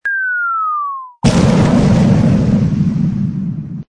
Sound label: boom